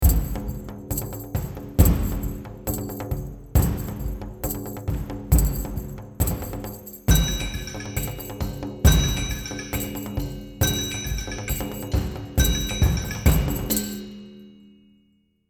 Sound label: Perc